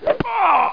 spear hit

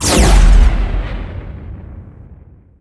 Neutron Gun; weapons; ImpGal2; spacewar

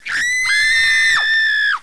Scream High; QuickDraw 3D 1.5; Gerbils!

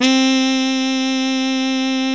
muted trumpet; yamaha xg; gmtones

